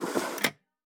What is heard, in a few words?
case Attach Non Metal